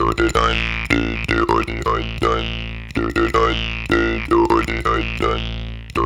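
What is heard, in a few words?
80 bpm, Boing; Ben Boing; Spectrasonics - Supreme Beats - World Dance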